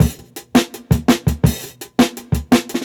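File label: Jungle Breaks, 168 bpm; Original Jungle Breaks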